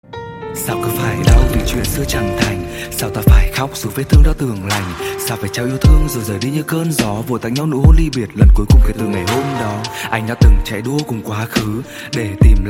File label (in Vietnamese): Nhạc chuông (Đoạn Rap)